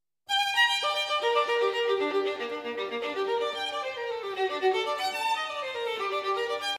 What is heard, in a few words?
violin